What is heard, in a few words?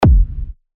Kick F; TECHNO; Spheres